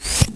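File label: medic attack; lmctf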